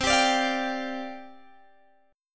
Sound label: C Suspended 2nd Suspended 4th, C, Guitar, Open G; down; chord shape